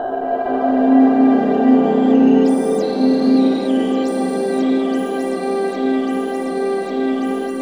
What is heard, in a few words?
CHAOS C4, Ethereal Atmosphere; USB Soundscan vol.13; AKAI